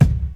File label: classic hip hop kick drum single shot c sharp key